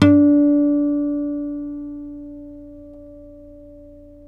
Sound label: NYLON HARM D, GUITARS; Synclavier Sampler Library